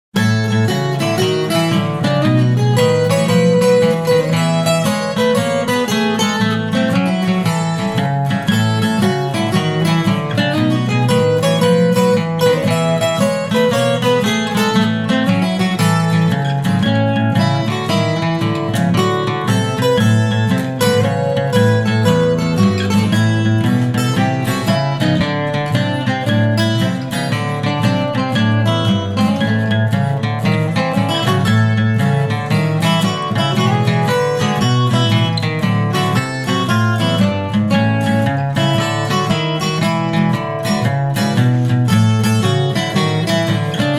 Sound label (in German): blues, Gitarren; ragtime; 2 gitarren